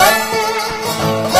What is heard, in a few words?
dobro danger; Left 4 Dead 2; Source Engine; zombat